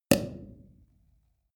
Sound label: sparkling wine cork open foam hard